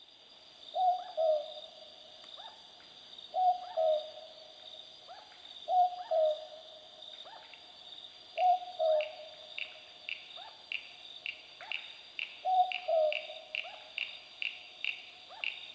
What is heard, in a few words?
Southern Boobook Ninox boobook